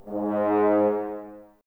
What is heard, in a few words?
G#, Brass, F.Horns, Woodwind Brass Ensemble; Sample CDs; Miroslav Vitous